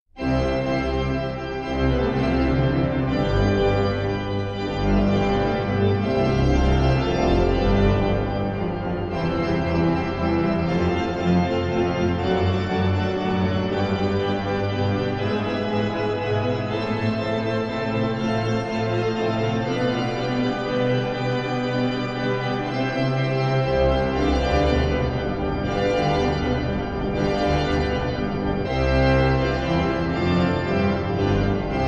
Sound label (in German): Concerto C-Dur Allegro